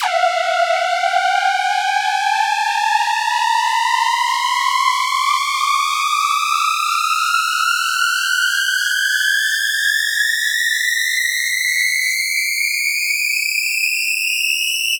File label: FX Uplifter